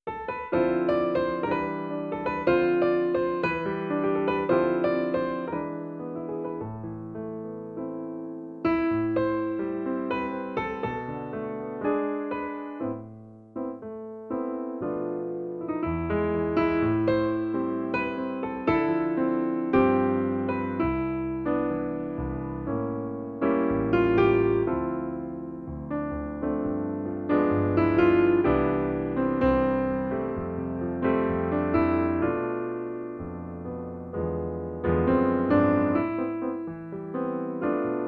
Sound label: bossa nova; black orpheus